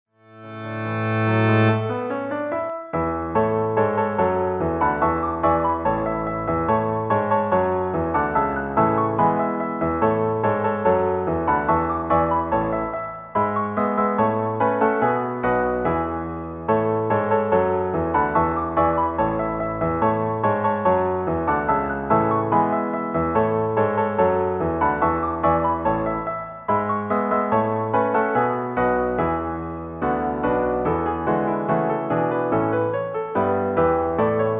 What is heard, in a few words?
Long Dance Only; sorcerer; Tis Twelve I Think